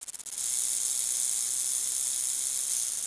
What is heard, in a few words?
snake rattle; cstrike; kzsca snakebhop